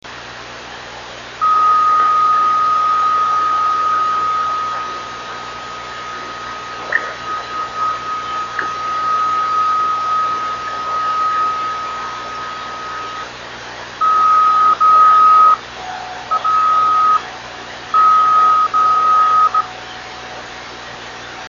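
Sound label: drift net beacons; 26.545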